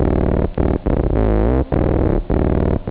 distort; OctaMED SoundStudio V1

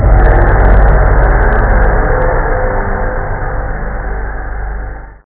gongo

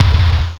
Drums; alone in the dark 4

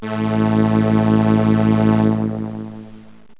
arpsolina bass drone, strings; Zoom - Release 2; Active Software

